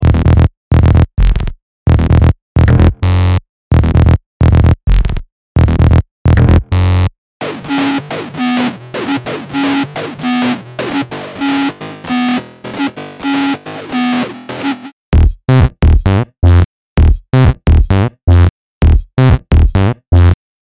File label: Bass Loops